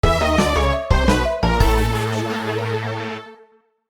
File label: Item Minigame 2 (Bad Item)